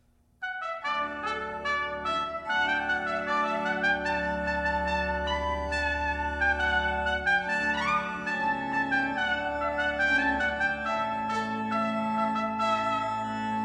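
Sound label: Rondeau with Organ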